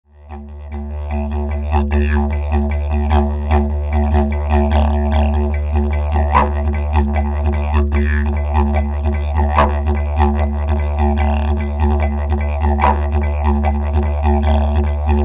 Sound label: didj